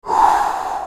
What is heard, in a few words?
Wind